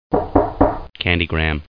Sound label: Knock